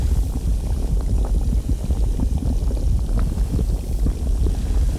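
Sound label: lava roll loop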